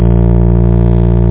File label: buzz; Funschool 2